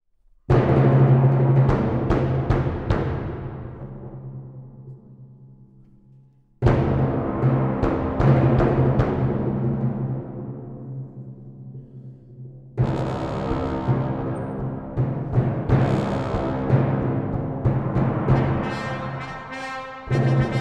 Introit & Flourish FULL Brass

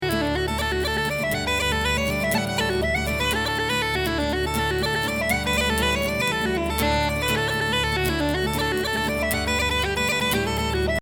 Beginner Reels @120

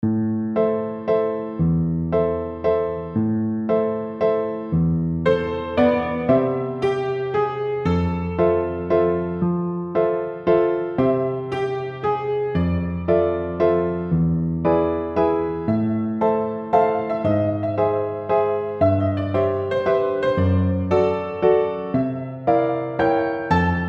Theme and Variation Waltz

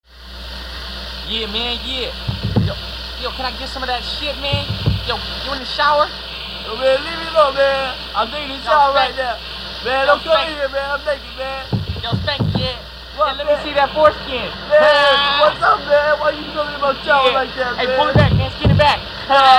Rap

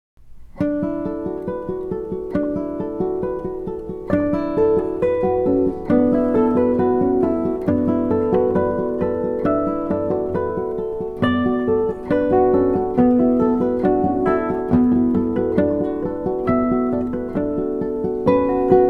Chinese Carillon

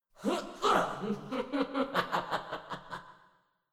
Princess Spawn VOX

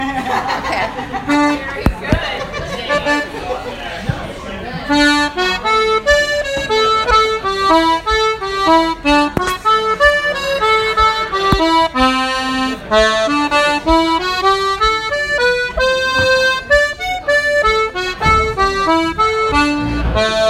Jig

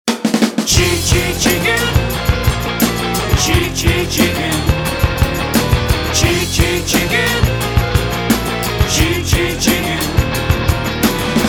Chick Chick Chicken

